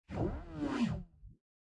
Board Upgrade; subway surfers city sound effects; Android, iOS

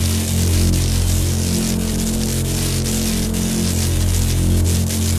Laser Loop; Going Under; Avie

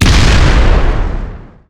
Enemy Temple Active Hit

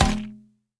Weapon Metal

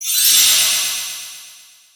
Enemy Woodblock Heal; disney star smash android ios mobile gamerip 2020

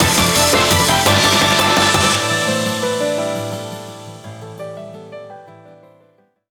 Bgm Reach

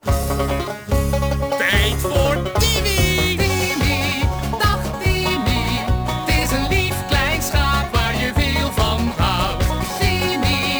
Theme Song (Dutch); Timmy Time (DS) (gamerip) (2011)

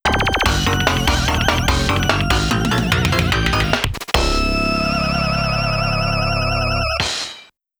Game Start, FM Synth; Castlevania Chronicles; PS1

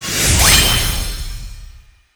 Timeattack Icon Rank Up

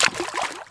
Bath Water; Neighbours From Hell Console Edition; GC, Xbox

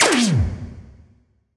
Battery Detached From Bar

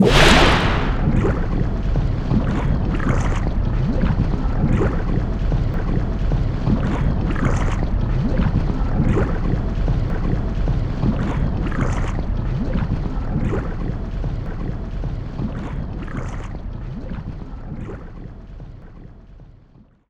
Enemy Bigclam Active Loop

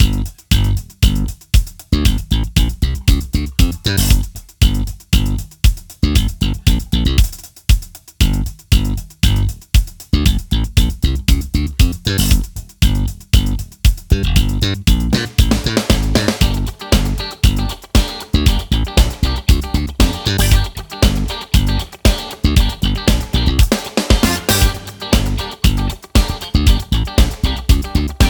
Disco; stardust galaxy warriors stellar climax; Disco (Hyperspace 1st Phase)

